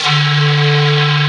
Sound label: FX BLOW